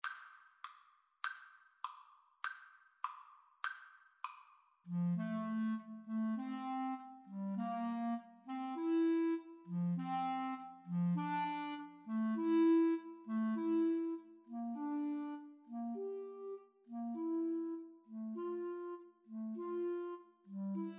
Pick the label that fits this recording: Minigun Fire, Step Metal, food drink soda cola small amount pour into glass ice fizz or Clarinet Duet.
Clarinet Duet